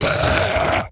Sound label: scream; Deerclops